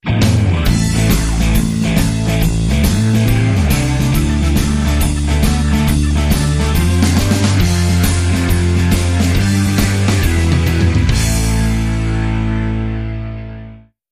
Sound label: Blues